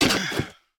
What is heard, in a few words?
footstep Landing; steamtower